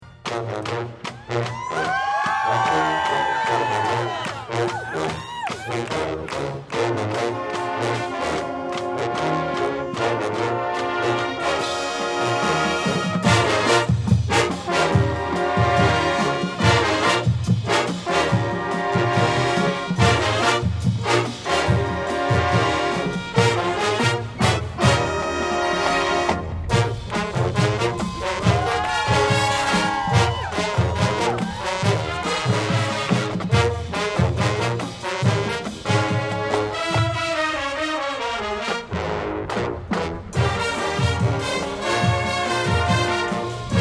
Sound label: blues; celebration